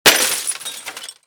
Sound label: crt monitor smash